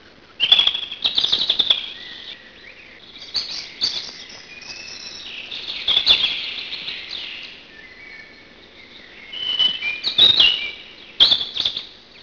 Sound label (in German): rotkehlchen, singvoegel